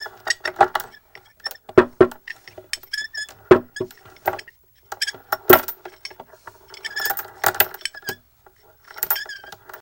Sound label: Sign Mvmt Loop, chains